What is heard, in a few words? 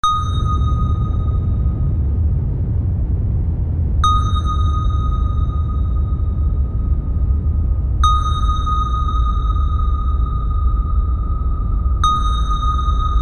SONAR; redshift 6; MT SONAR